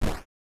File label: Character Shoot Hit